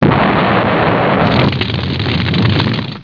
Crash

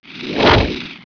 vroosh

